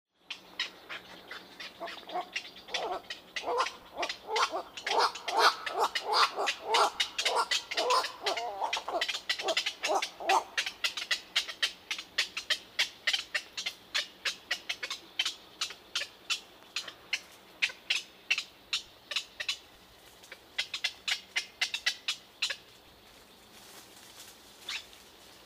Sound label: Black-crowned Night-heron, Nycticorax nycticorax; wading largewater